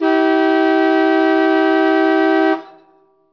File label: Car - Dixie horn